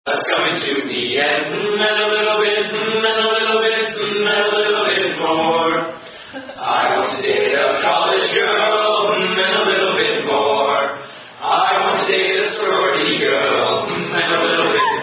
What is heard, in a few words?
Acapella; Stanford SigEp; I Want To Be A College Man